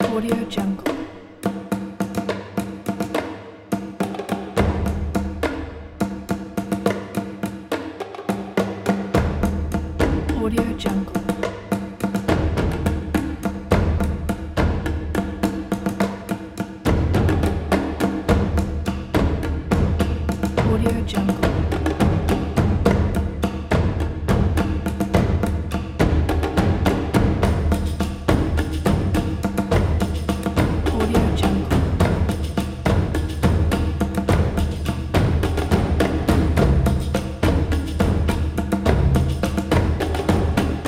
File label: african drums